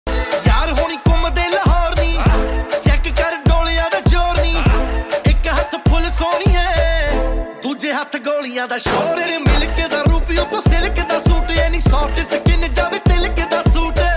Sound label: Song Ringtone